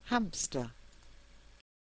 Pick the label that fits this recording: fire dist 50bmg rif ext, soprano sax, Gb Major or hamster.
hamster